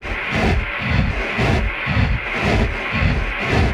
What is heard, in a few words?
Loop 128 bpm, Stretchmangled; 99Sounds; Mangling Audio